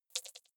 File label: click erratic wide buzz, signale